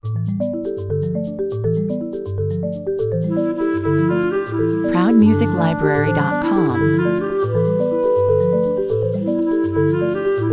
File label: mellow marimba